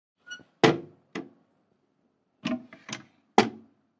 HOUSEHOLD TOILET: INT: Seat down & up, squeaky seat with toilet resonance.